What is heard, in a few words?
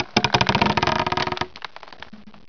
wood creak; XDM; ambience